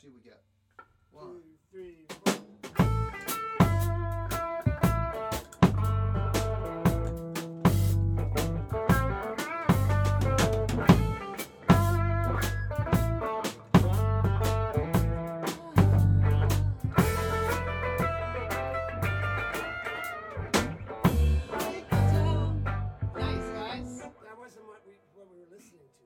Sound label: Breakdown gtr